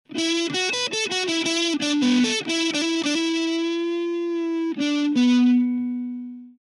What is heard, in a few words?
Strat Drive